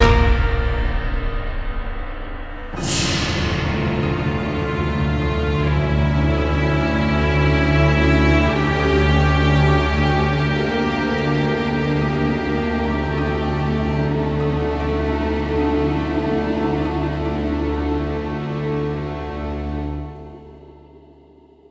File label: Generic Ambient Combat End; The Darkness 2 gamerip 2012